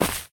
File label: hay step; Golden Crayon Sounds